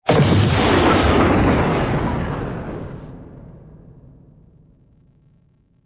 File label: fort cann explode; shipcharge; Pirates Of The Caribbean